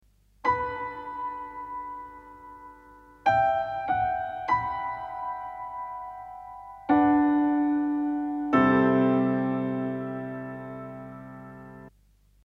PIANO

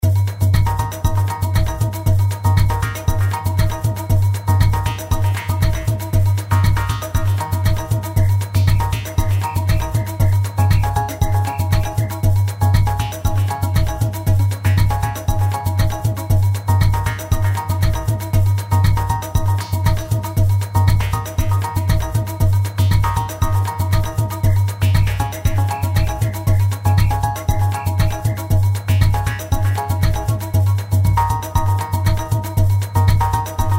SAMBA TECHBRAZILO

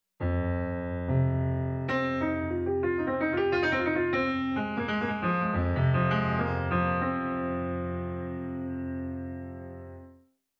PIANO

